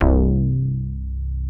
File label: BASS; ZERO-G; Datafile